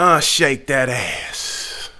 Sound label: Rap Vox